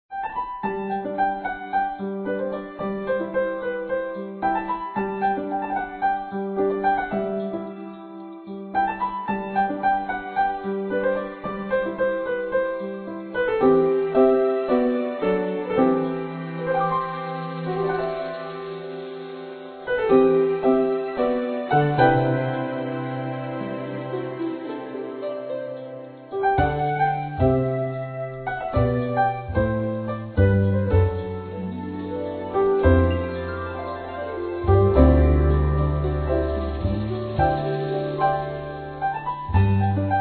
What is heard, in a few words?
Massage BGM; Samsung 2008; TV